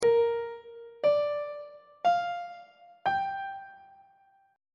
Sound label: b flat major 6th chord intervals on treble clef